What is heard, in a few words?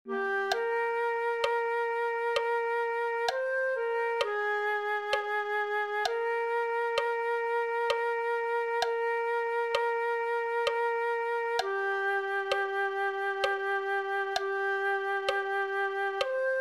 flute